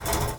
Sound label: toll bench rise